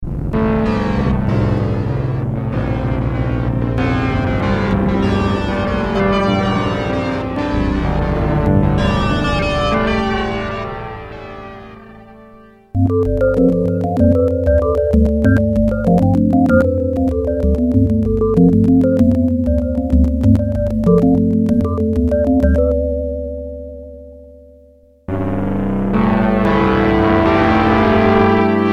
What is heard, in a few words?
Kawai K1 comp analog